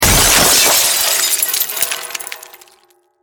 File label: Impact Crash Glass Wood Metal; Crashes; Objects; SFX Bible